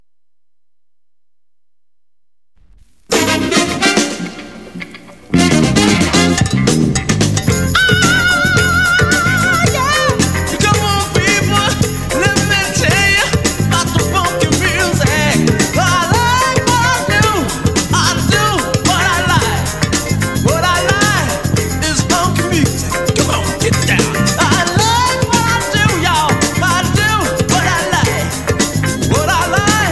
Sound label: funky music